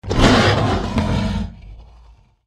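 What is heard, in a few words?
deathclaw power attack; npc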